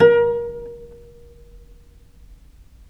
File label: viole pizz A#3, Historical Instruments; USB Soundscan vol.23; AKAI